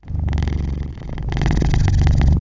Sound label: Purr Start, cat; talking tom cat 2 android ios online gamerip 2011